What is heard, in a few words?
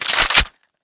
tommy draw slide back